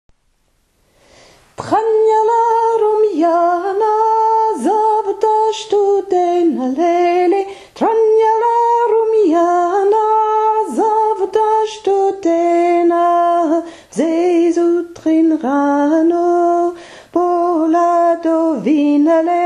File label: alto vite